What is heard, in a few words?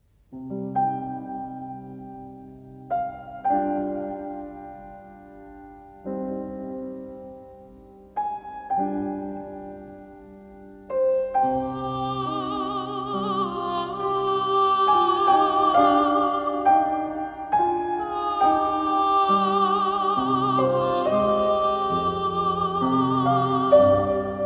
Piano & Soprano Voice